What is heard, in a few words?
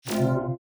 chime